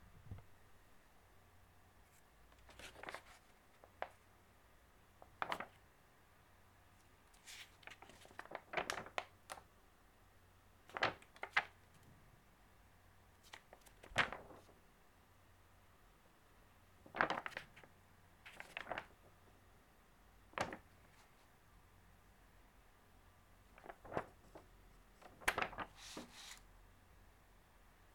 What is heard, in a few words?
To Turn The Paper Turning The Newspaper Long Version